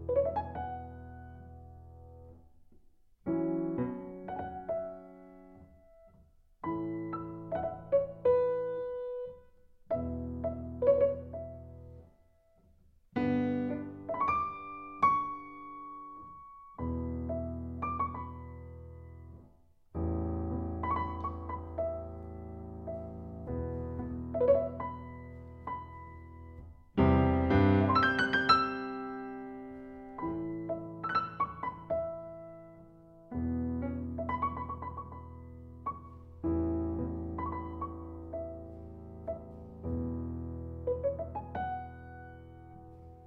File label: Ballad